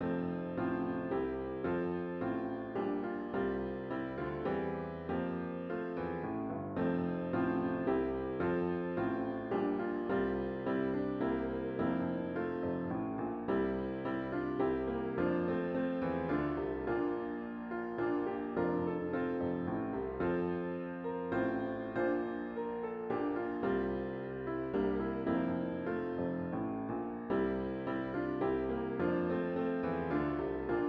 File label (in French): hymne national polonais; traditional